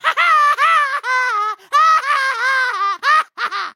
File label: laugh; 吉格斯（英雄联盟）; 爆破鬼才